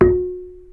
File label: GAMBANG F4, GAMELAN SET; Spectrasonics; Heart of Asia